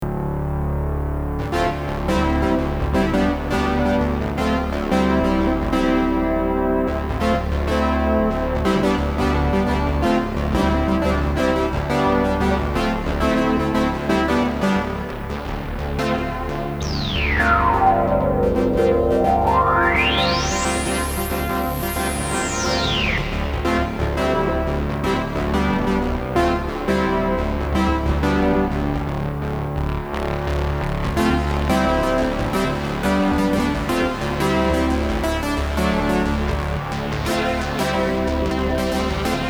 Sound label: OB-Xa Brassy